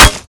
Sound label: hammer strike